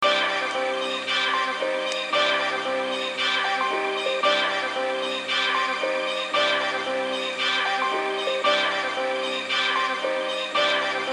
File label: Drum Kit